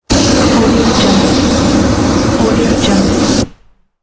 flame burst; wistansound

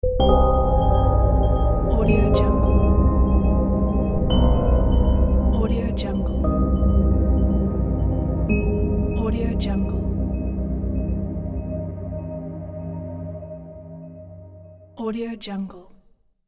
dark star ident

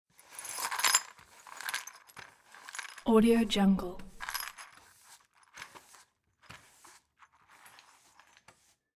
glass shards sweep on table

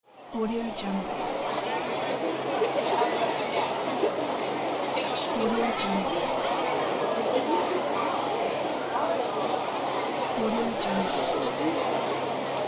restaurant ambience

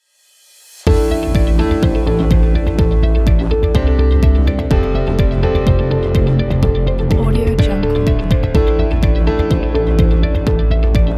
bioengineering technology kit

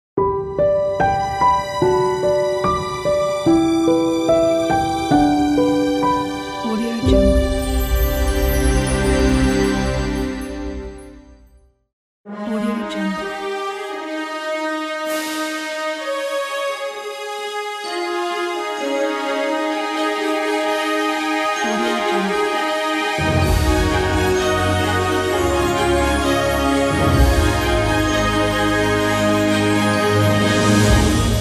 ident and logo bundle pack

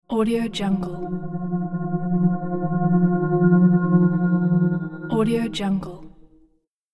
flutter transition

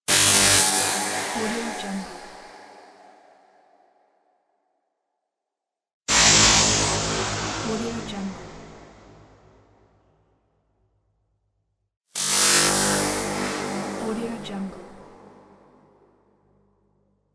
electric logo

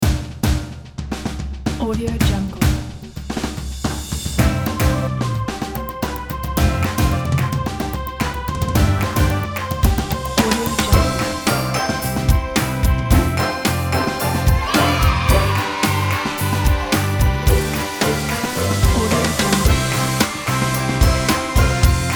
energetic children pop; indieplanet

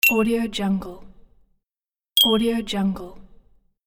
glass hit; bohdanshoshyn

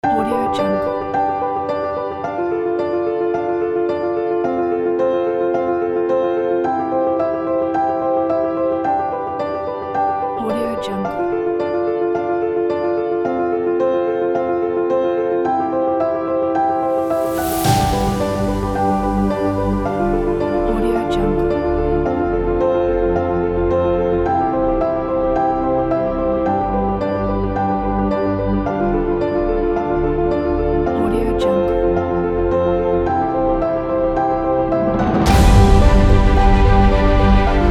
background music

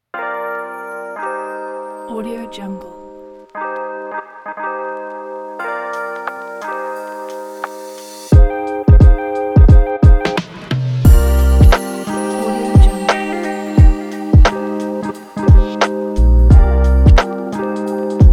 lofi chill vlog

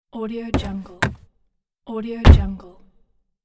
car door open and close inside

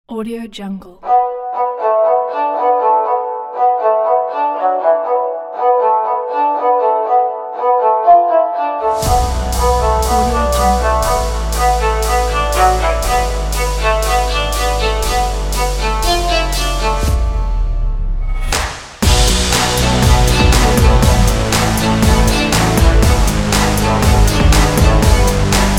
epic dramatic action game

